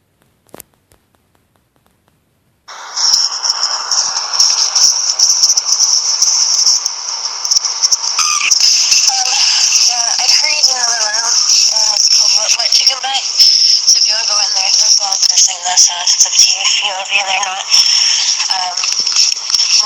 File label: laughs; trolling